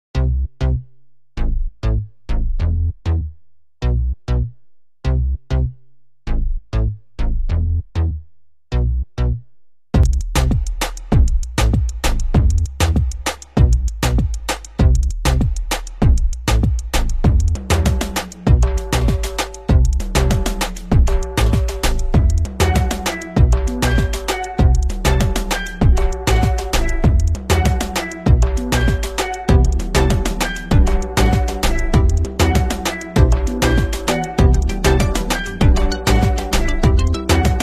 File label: Latin Beats